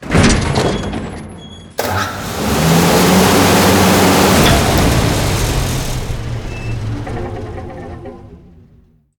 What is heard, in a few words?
Bucket Dump Avatar; moul; tldn